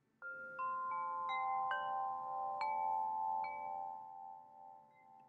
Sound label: Timer with Chimes